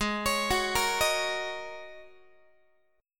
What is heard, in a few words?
Ab 7th Suspended 2nd Suspended 4th, Guitar; Ab7sus2sus4 arpeggiated; x,16,16,16,14,14